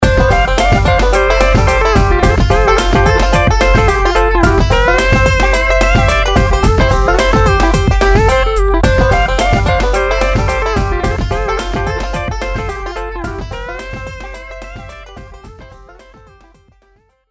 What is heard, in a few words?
country